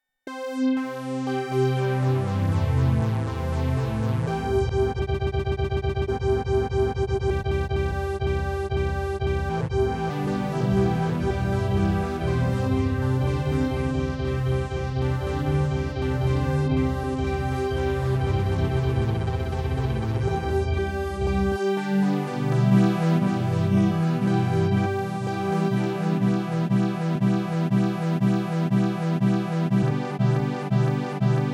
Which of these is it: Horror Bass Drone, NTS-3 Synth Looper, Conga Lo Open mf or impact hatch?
NTS-3 Synth Looper